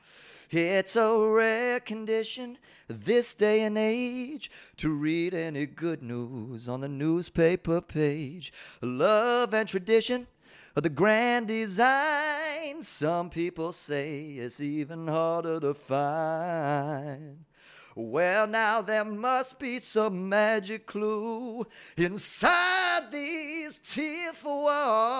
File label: Acapella